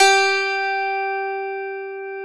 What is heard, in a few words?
lion harp G 1; SoundBytes; Early Patches